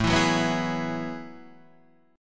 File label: F sharp Minor 11th